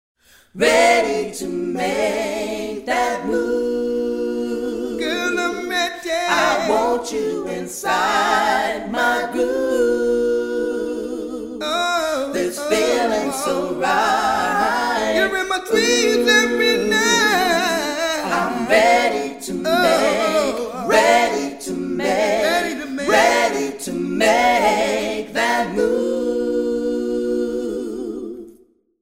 Vocal Group Hall

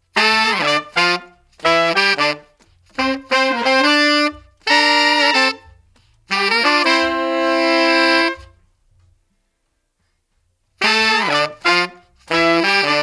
Horn Section Dry

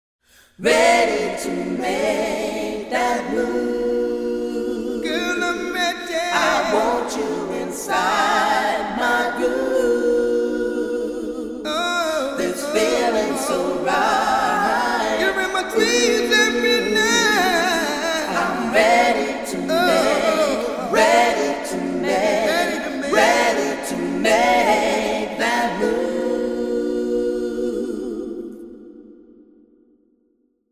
Vocal Group Concert Hall

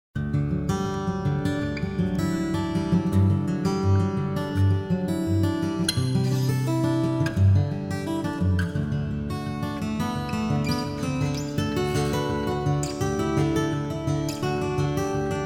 Acoustic Guitar LXP Plate

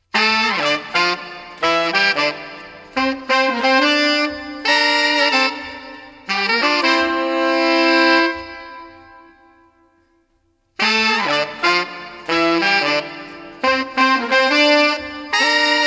Horn Section Concert Hall

